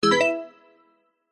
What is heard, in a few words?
multimedia game sound positive warm bright bell mallet tone short